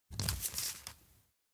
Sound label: stampcard pickup; anemoi